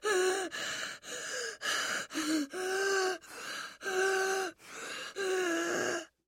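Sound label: wheezing in a human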